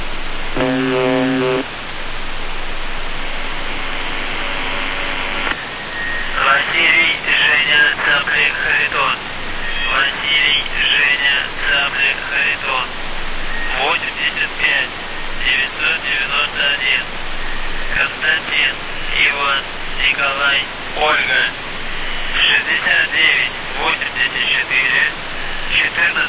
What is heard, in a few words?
buzzer usb msg